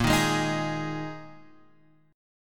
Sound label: Ab Suspended 2nd Bb